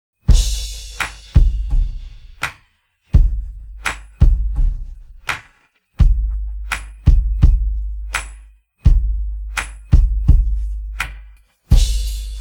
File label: pattern, drums